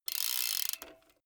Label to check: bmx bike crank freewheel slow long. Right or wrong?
Right